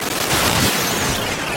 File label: Stinger; Sound Effects Bible